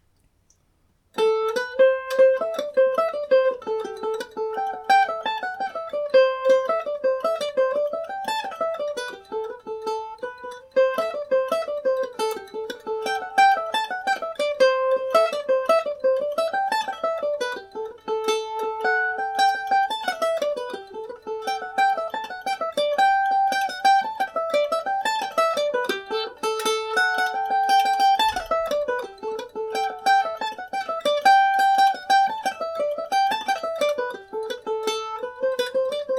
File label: A dorian, Irish mandolin; pipe on the hiob